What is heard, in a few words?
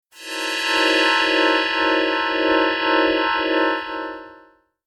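down chord; HITHER GATE MUSIC; comet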